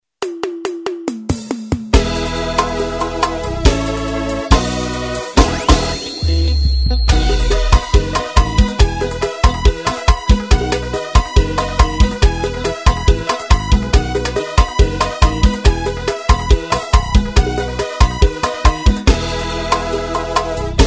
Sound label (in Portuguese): play back forro